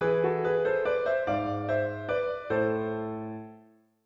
Sonata para flauta em Mi bemol Maior; Bach

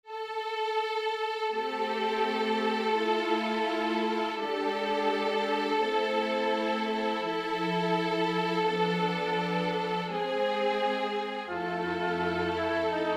Canto e contraponto Am

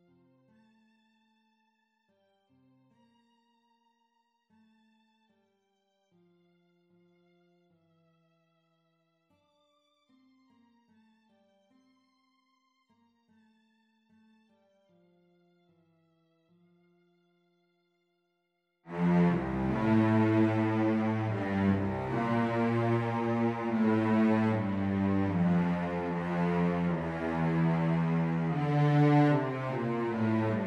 Violoncelo Dona Nobis Pacem

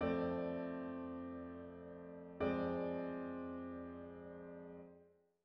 Estudo de Harmonia acorde de subdominante com sexta acrescentada

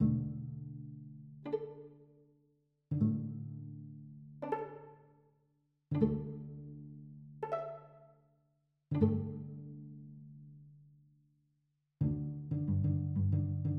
Estudo cordas soltas pizz

